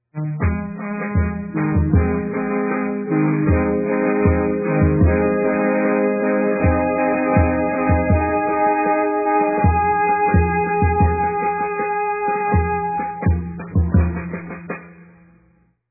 fanfare lowy

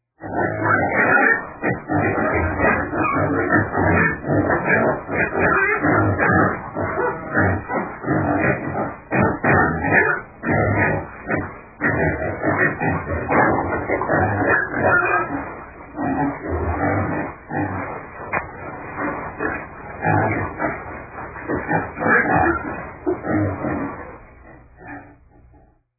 pigs

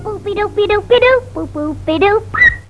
boop

